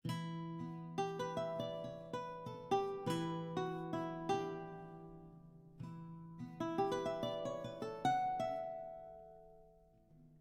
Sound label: baroque guitar; gamerip